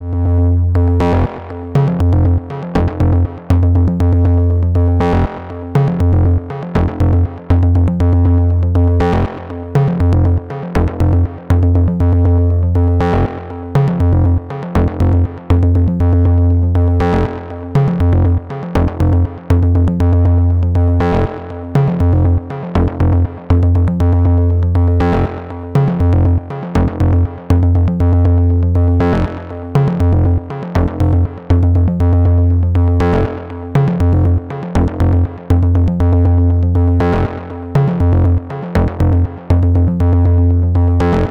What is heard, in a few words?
Pulse